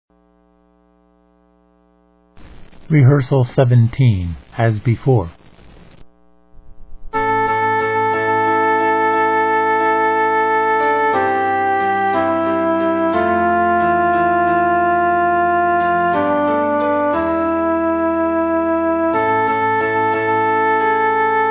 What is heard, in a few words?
cecilia soprano